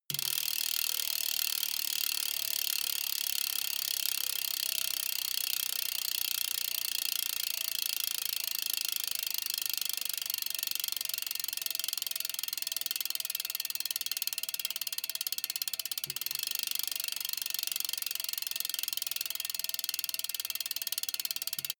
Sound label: bmx bike freewheel push and slow down